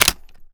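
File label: weapon foley assault rifle xmag reload mag in; gmod; viper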